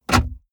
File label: car bmw e46 interior drink holder insert bottle hard